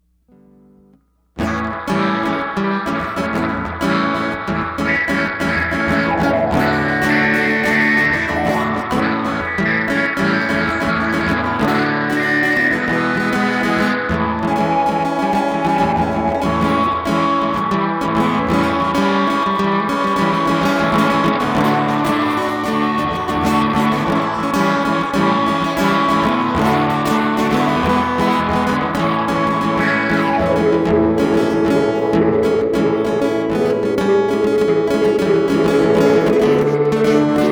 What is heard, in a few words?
Instrumental; hyper terrestrial